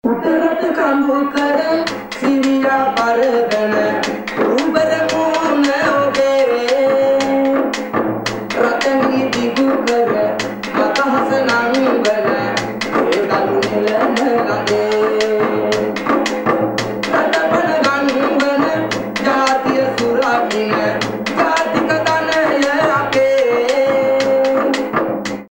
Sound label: sri lanka, grooves; music elements